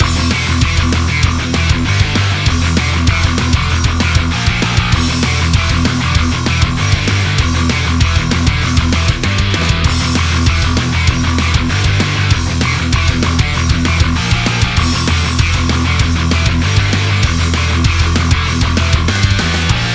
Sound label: battle